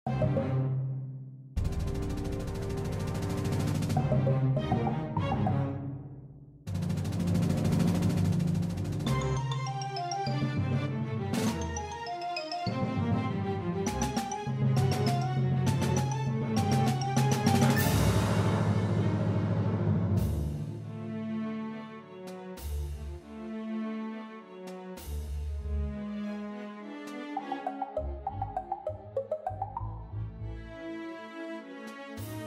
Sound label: string orchestra percussion; Obertura ocal2003 revision2017